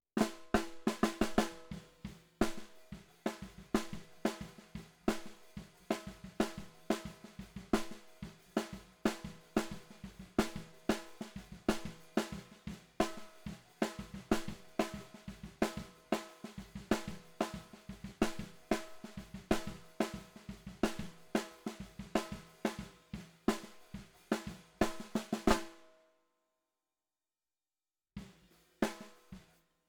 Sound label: SNR Bot